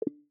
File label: navigation backward selection minimal